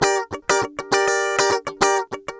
GUI 100 BPM

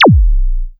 TOM ELECT; USB Soundscan vol.27; Ragga & Dub Reggae; AKAI

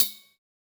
SPLASH, Akai MPC-3000; 300 Drum Machines

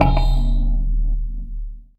SYN FX, FX PERCUSSION

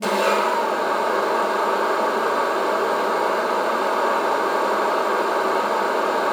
luft, alto sax; Xsample VOL-2

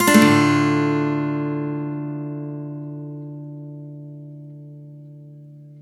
D min upstroke, Acoustic Guitar; Sonic Foundry (Sony Creative Software); Songwriter Acoustic Guitar Companion; One Shot Chords

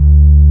SUB BASS; Techno Trance; Synth Basses; E-MU Formula 4000 Series Vol. 2

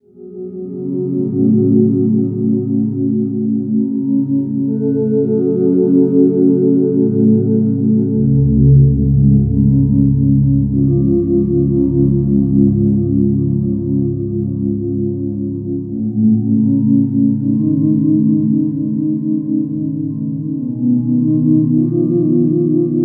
Ambi Cloud, Keyboards; Sonic Foundry (Sony Creative Software); World Pop

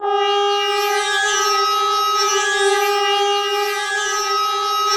SCREAM2 C4, Yamaha VP-1, SCREAMSYNTH; SCREAMSYNTH2; Music Store

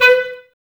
Clarinets, Wood Winds

